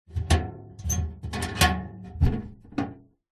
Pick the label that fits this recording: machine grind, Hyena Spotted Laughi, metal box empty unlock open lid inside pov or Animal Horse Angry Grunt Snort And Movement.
metal box empty unlock open lid inside pov